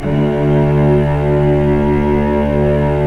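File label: strings, mf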